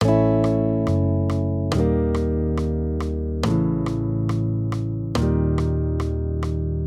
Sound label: maj triads; A-Eb; accompaniment